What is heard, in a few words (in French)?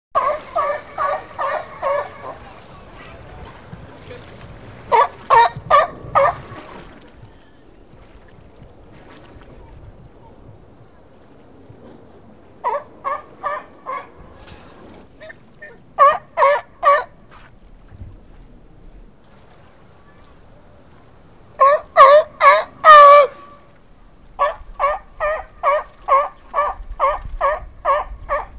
lion des mers otarie